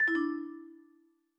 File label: browse Mode; NVDA; Cubic